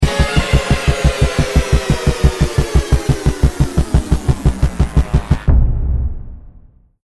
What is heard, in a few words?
lost round, music; Counter-Strike Global Offensive